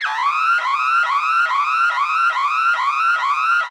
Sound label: battle cannon air overload